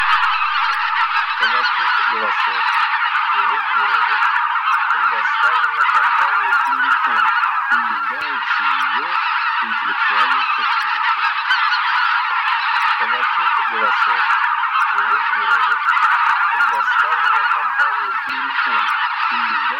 Белолобый Oca Lombardella; plurifon

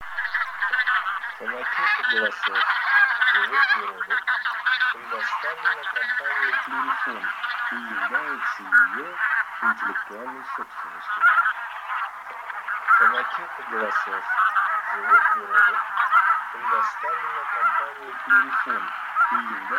Гуменник базар Oche Granaiolle; plurifon

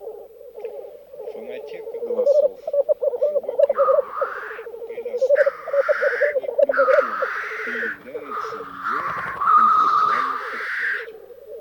Тетерев Forcello; plurifon

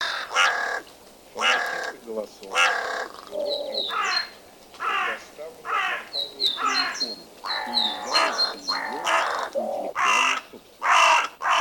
Черная ворона Cornacchia; plurifon

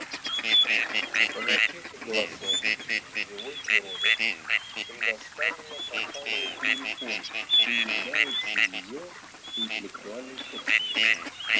Серая утка Canapiglia

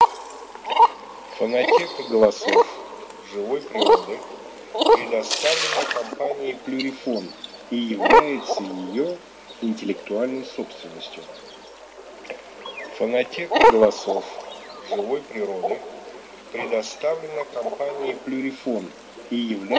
Клоктун Alzavola Asiatica; plurifon

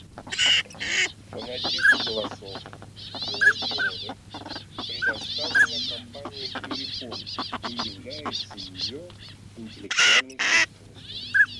Шилохвость Codone

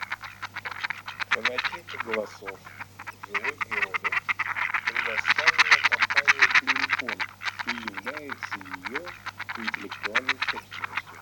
Кряква Germano; plurifon

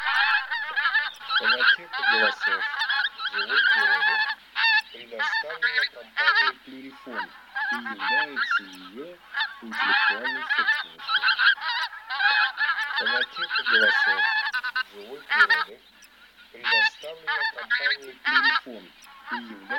Гуменник Короткоклювый Oche Zampe Rosa

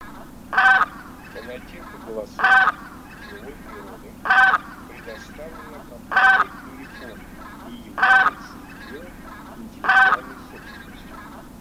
Гуменник Oca Granaiola; plurifon

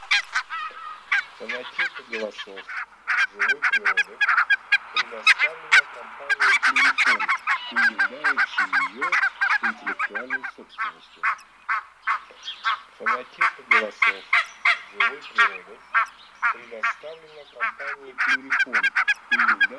Казарка белощекая Oca Faccia Bianca; plurifon

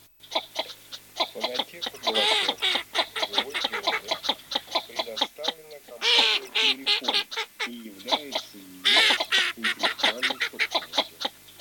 Широконоска Mestolone

